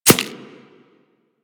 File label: Arrow Impact Flesh; Warframe; Weapons